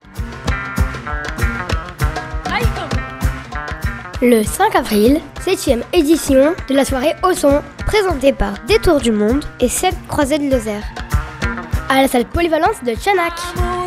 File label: jingle; aux son 2024